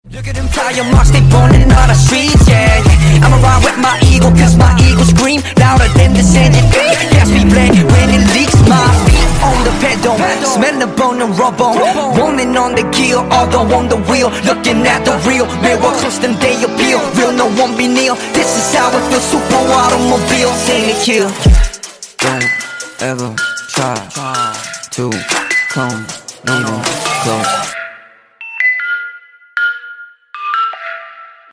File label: bass boosted